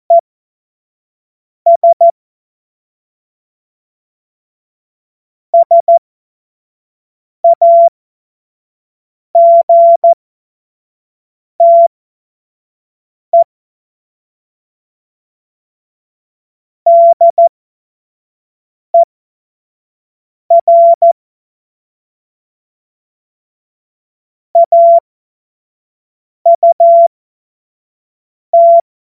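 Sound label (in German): Witz, Morsekurs, cw morsen; Witze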